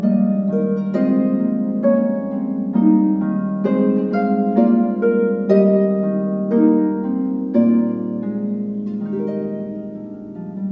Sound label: Fugue in E-flat major; "St. Anne" BWV 552 for organ